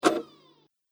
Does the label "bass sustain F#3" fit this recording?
No